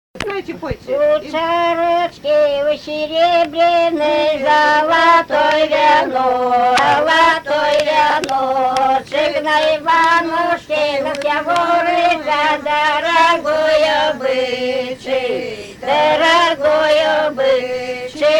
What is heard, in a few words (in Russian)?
Во чарочке во сяребряной, Свадебные песни; Псковской области; Объекты нематериального культурного наследия Псковской области